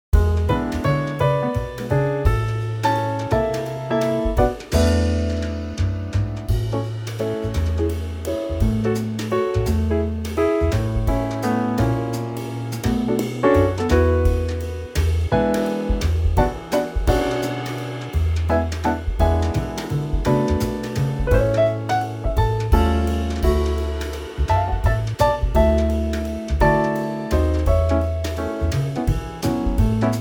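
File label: A TRIO, backing track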